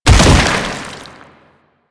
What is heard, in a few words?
Bodyfall Light; Enemies; Warframe; Proto Infested